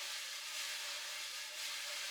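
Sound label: slow brush; Northstar - New Gold VOL-2; 50S BRUSH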